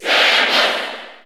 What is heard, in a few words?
Cheer English; Samus; SSBU